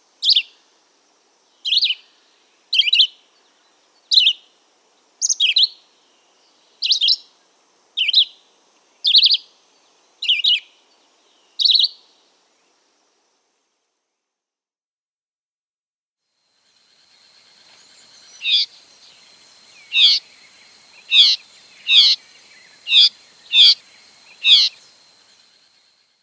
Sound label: Red-eyed Vireo